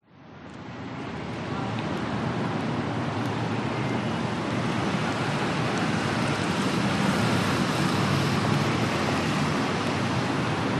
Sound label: urban street amb people traffic singapore; suntec city